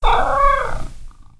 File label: cat mew purr